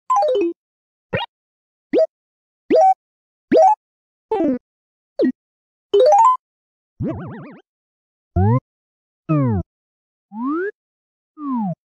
Menu, cozy menu pop; Animal Crossing

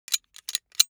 gun metal click; gun metal fx

